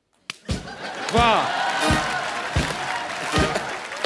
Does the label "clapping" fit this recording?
Yes